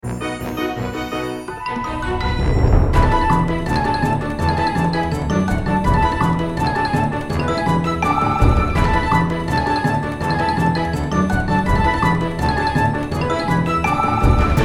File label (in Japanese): 急け; アップテンポで運動会の徒競走などにも合いそうなBGM; クラシカル, シネマチック